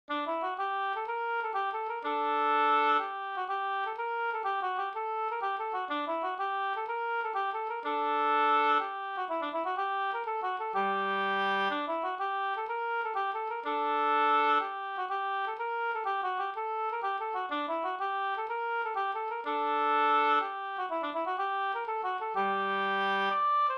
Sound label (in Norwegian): pols; e Hans Brimi no